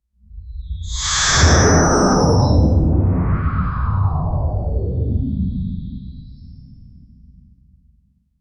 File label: a giant sci fi space port